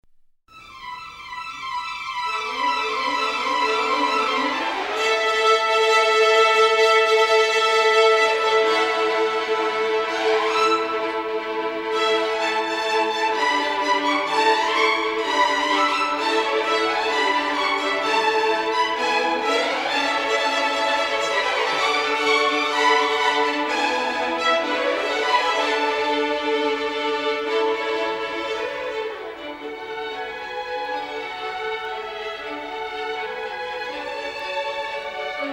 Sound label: Violins Country; Violins B: (Country)